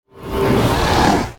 defeat soul return; Smite; Cerberus

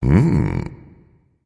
thinking, voice; Smite; ymir skin9

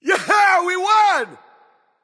"yay"; smite; merlin

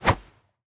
mvt punch; smite; gilgamesh

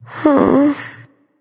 sigh; smite; artemis

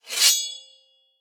minion battlefoley swordscrape; smite; npc; roman archer

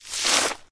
victory beard comb; smite; god odin

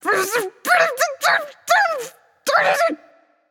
nv grumble; smite; awilix

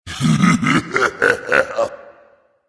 laugh berserk; cuchulainn

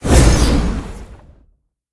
activate; Smite; Tiamat

